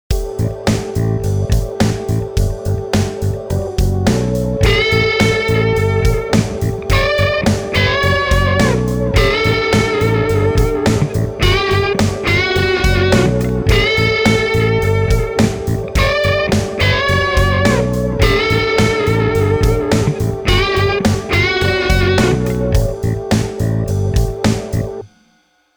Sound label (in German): Gitarrensound fetter machen Lick